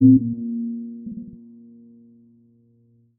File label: Noisy Digital Octaver C1